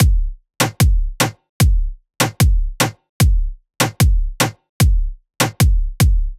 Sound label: drum loop reggaeton 75; ANDRS MRCIO